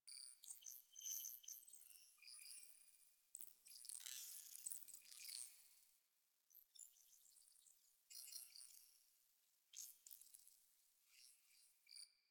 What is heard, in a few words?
UI Mod Screen Loop; DE; Warframe; Railjack Player Ship